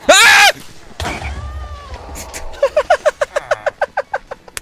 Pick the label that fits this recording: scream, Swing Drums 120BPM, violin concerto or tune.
scream